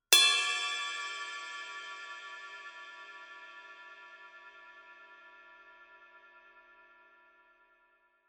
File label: Power Ride 20 Bell; Signature; New Instruments 2021